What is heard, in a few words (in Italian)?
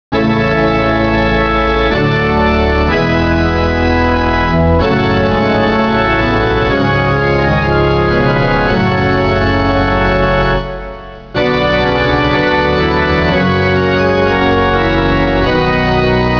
organo